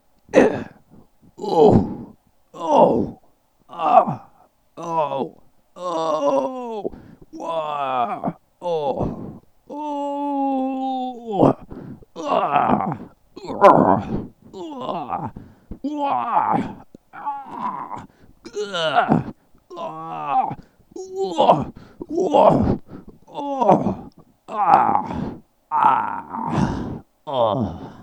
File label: male pain